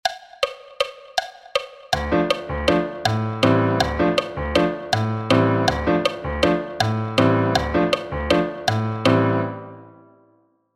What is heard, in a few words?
rhythm accents; song forms pivot chords